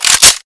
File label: svd bolt pull